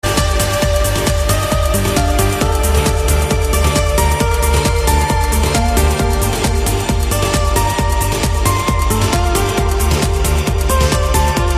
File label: Space Guitar